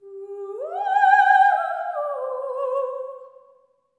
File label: LEGATO, Symphony of Voices; Spectrasonics